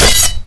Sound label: ubersaw hit, weapons